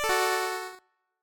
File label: hero chord